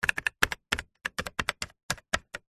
foley cash register electronic buttons press sequence; zapsplat